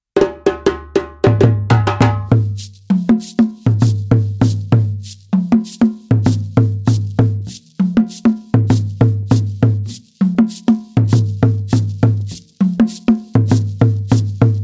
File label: denadon dunun ballet; rhythms